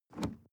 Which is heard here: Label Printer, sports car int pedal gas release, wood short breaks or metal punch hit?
sports car int pedal gas release